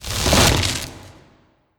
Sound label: Ice Attack Spell; Ice Wizz Attack Spell2